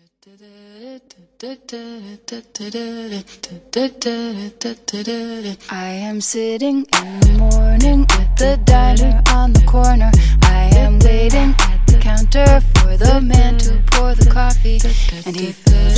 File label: Funk MashUp Remix, 103 Bpm